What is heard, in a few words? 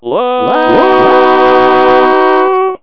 Quick C chord, DECtalk